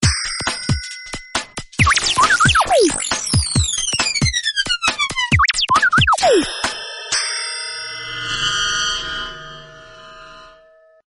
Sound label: electronic waves new tone ringtone